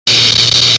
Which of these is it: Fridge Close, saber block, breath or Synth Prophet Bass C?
saber block